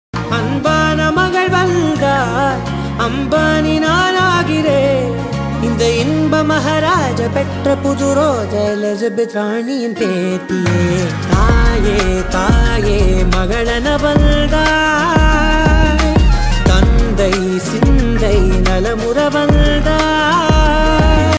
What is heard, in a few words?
Song Ringtone